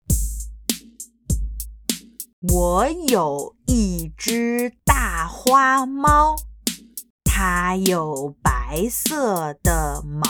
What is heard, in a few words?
Chant